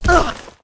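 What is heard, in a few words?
damage; Aileen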